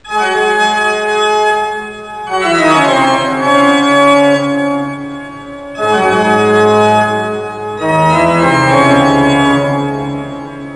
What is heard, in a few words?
Bach Organ